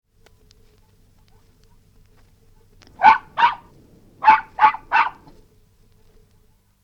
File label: Maltese Terrier barking; Part of Dogs (Sound Effects:Animals); BBC